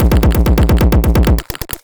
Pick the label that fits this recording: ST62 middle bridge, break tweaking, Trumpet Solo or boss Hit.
break tweaking